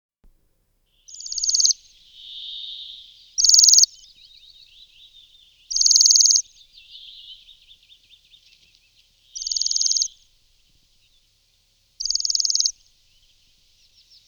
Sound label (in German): seidenschwanz, gesang; singvoegel; roche 2004